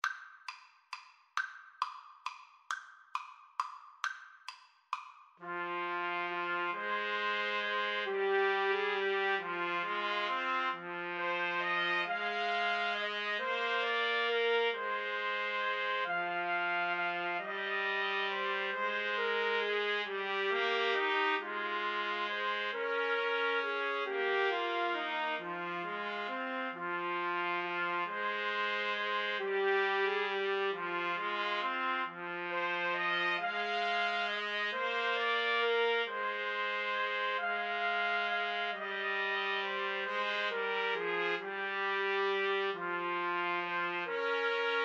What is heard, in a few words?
scarborough fair, Trumpet Trio